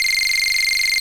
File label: Bell